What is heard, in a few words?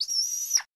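Hawk Long Squeal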